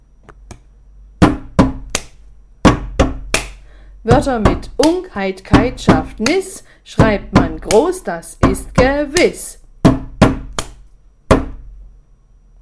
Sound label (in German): Rap; Ungheitkeitschaftnis-Rap